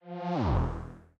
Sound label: fail; equivalent-exchange-3